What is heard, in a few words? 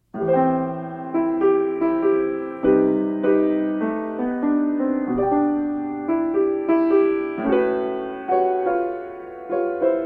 Blues&Ballads stream, piano (performing on the Kawai RX-3